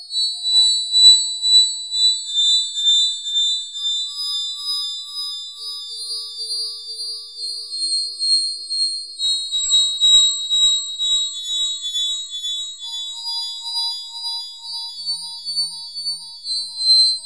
AMBI BELL, BELL ATMOS; Zero-G Ambient 1

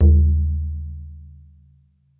BODHRAN, Celtic Percussion

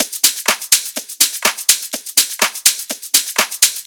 124 top loop; vegas

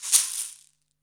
shaker; Zero-G; Total Drum Bass

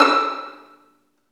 Vlns Pizz; Roland L-CD702; Vls Pizz

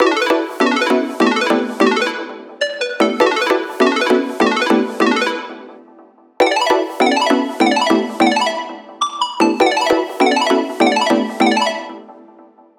synth loop 150 Emin; pixelparty; Virtual Riot

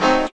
P. CHORDS, KEYS

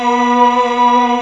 MELLO CHOIR

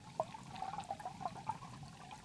Waterway D Dark

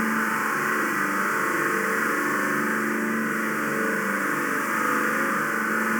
Panned Organ, non tempo loops; stereo toolkit samples

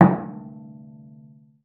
CELLO FINGER; 90 s Sample CDs; Xsample VOL-5

